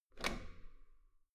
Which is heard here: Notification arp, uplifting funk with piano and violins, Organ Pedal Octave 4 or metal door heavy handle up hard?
metal door heavy handle up hard